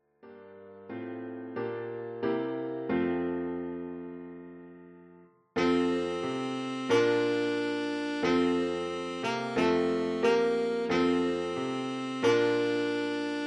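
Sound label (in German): O du froehliche, Altsaxophon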